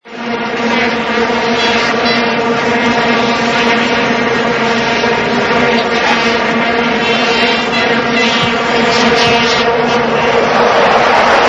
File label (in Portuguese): Vinhetas Vuvuzela; Comemorativas